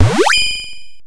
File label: Coin; TigerHeli